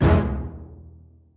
orchestra hit melody kit

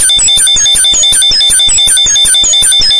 160 bpm tweak scream, drum loops and breaks; 141 to 160 bpm

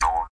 jawharp E2 diouw; WORLD

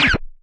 nasty stormfront; Nasty Stormfront Electro FX

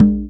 arabic perc, Arabic Percussion Kit

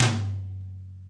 real life TOM, Another Real Life Kit; AMBI; REAL LIVE KITS

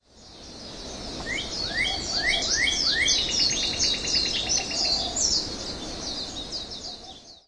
birds in hana; Zoo and Nature

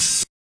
hat; Hats

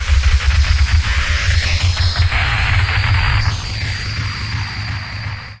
engine failure to launch; Various Strange Sounds and Effects

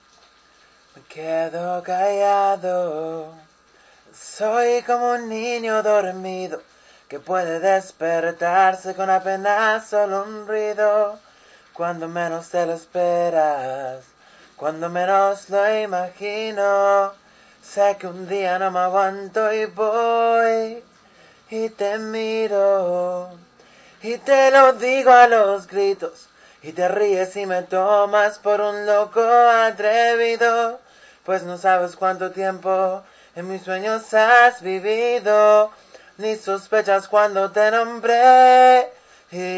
amateur spanish ballad; vocal acapellas; various acapellas

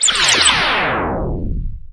electro spindown; Scratching and Turntablism

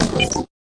fx drum, Electro FX

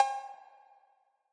808 Cow Bell, hip hop; STYLE KITS

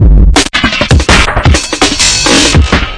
130 bpm freakout, drum loops and breaks

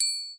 triangle; ETHNIC and WORLD PERCUSSION

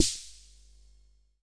rhma sd, Whippany Rhythm Master; drum machines; 1970s

